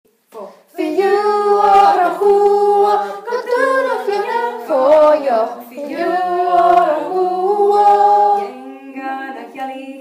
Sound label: Gaelic women's working song